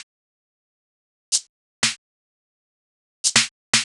drum loop clapper percussion 125, Classic Chicago House; Sample Magic; Drum Loops